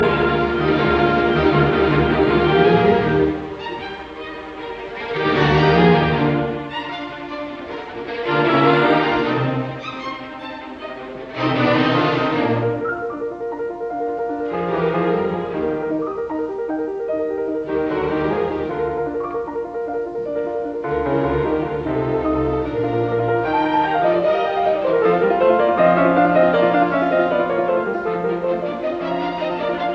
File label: Piano Concerto in E flat major Rondo Presto; Mozart; Jeunehomme